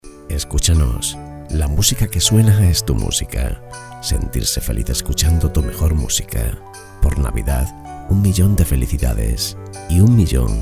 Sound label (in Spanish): jingle navidad; Mensaje de felicitación navideña número 3